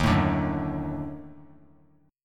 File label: Eb Minor Major 7th Flat 5th Eb down; EbmM7b5; strummed; JGuitar